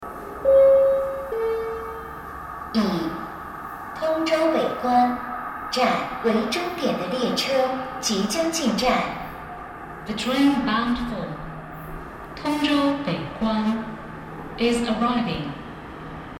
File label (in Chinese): 6号线-通州北关方向进站